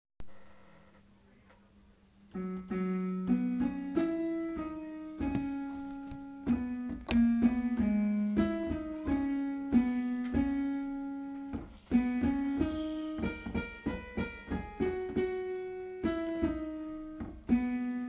tenori

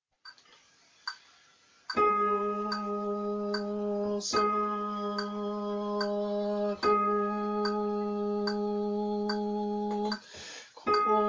tenori, coro; O sacrum convivium

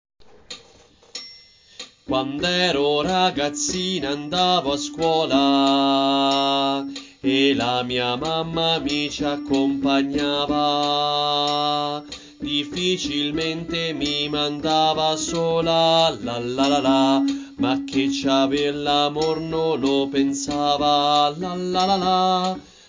Contralti; Coro Isola del Giglio

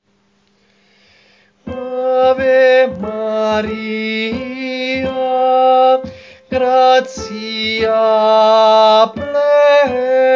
Tenori, Coro; Coro Isola del Giglio; Ave Maria Arcadelt

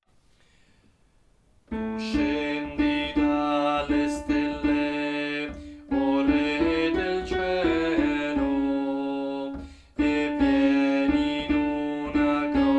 Contralti, coro; Coro Isola del Giglio; Natale

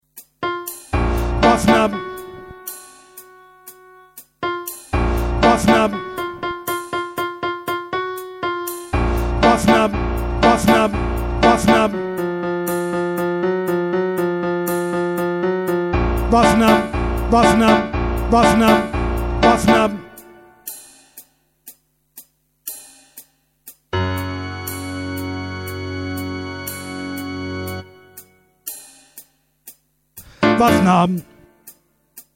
baritoni Ego sum abbas; Carmina

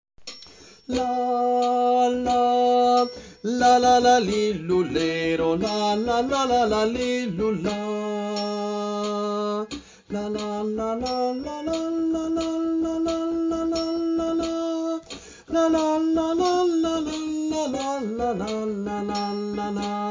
Tenori, coro; Coro Isola del Giglio; Il Trescone

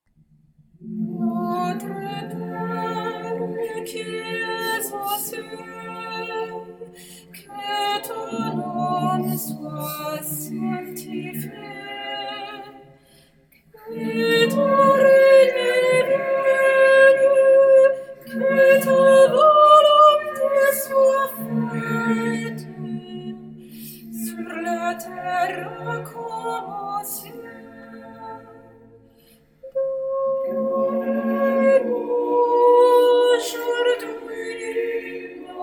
Notre pere soprani, coro

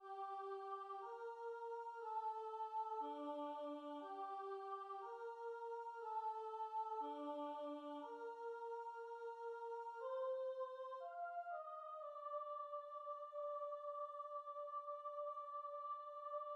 soprano; Natale

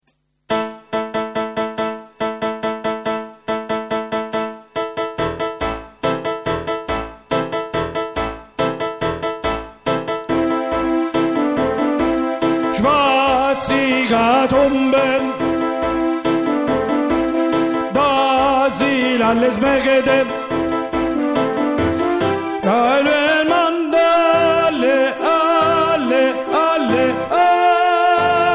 contralti, coro; Carmina; Swaz hie gat umbe

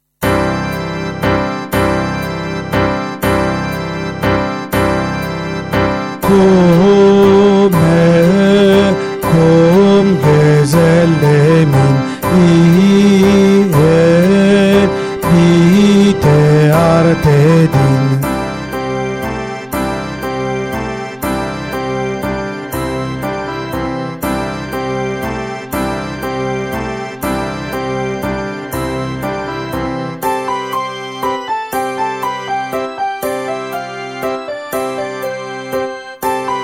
contralti; Carmina

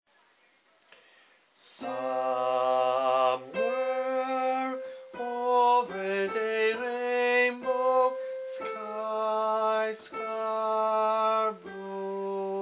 soprani

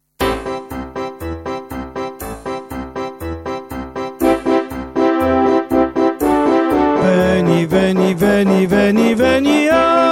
tenori coro; Carmina

